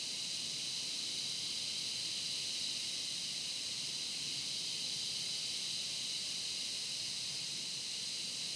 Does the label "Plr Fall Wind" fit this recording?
Yes